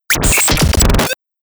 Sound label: Big Nailer, Breakers & Transitioners; Best Of N2O Library